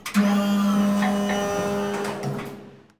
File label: lift